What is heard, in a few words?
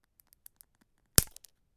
Pencil Snap